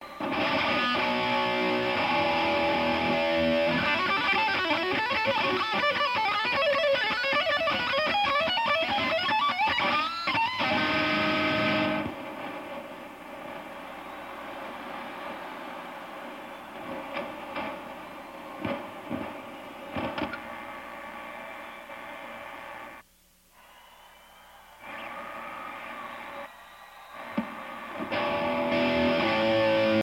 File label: Guitar Solo